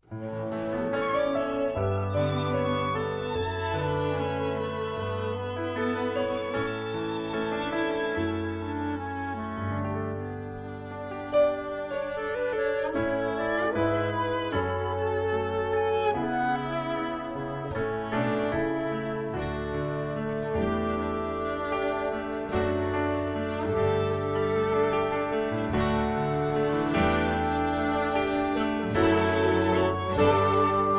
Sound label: Karaoke